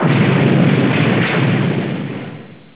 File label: explosion